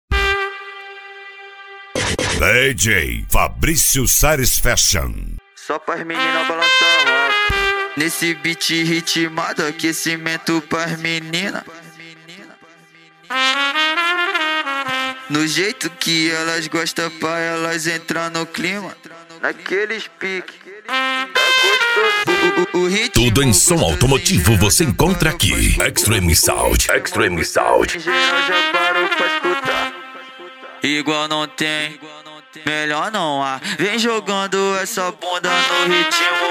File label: funk